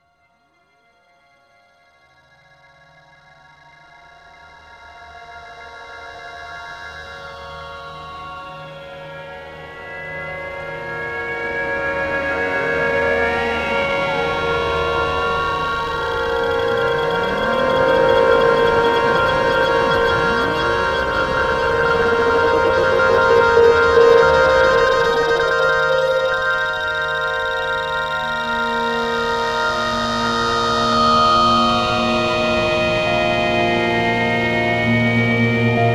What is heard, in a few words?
Solaris LFO mod EG